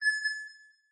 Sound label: Smartphone Notification Sounds; aXLsound